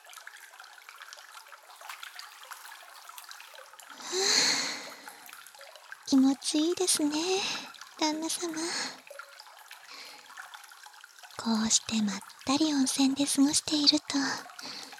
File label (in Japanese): 温泉でまったり（バイノーラルマイク収録 効果音あり小さめ）